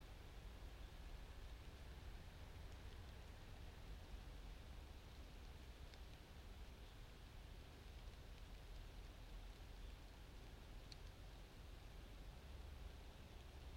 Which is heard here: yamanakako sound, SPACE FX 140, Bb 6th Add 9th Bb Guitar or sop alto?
yamanakako sound